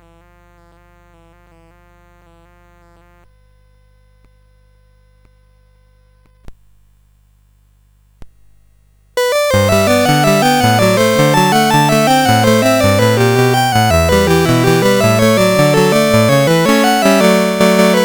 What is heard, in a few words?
CSG8580; CSG8580R5